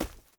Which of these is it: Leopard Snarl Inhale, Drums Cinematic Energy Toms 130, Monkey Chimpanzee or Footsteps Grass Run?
Footsteps Grass Run